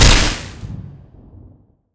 shockwave projectile end

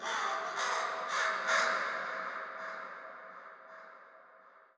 crow, ambient, nature, birds; Portal 2

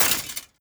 step, footsteps, player, metal chainlink; Half Life Alyx

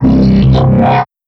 vox c dry, strider; creatures; Half Life Alyx

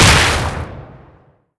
headcrab reviver ranged impact layer; creatures

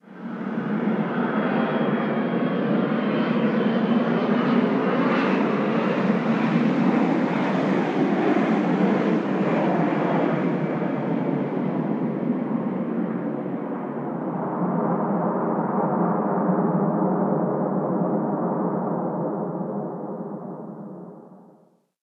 airplane, ambient; nuke

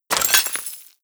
debris pottery, bullet impact debris

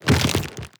step movement, zombie blind, foley; creatures

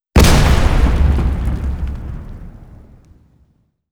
grenade explo boom; weapons; Half Life Alyx

